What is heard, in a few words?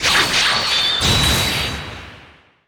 gas